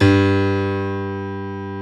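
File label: piano G1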